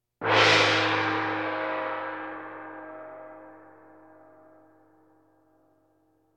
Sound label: Percussion Bongos Tympani rolls Gongs Drums